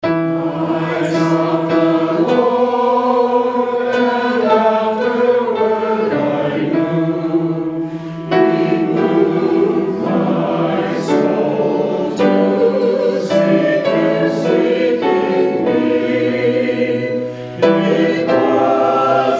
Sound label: Hymn; I Sought the Lord